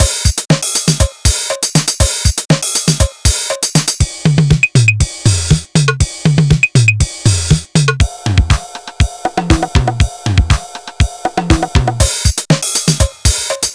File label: new drum machine; weeklybeats 2014